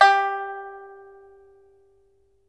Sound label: PIPA G5, Heart of Asia; Spectrasonics